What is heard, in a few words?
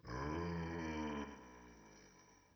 grunt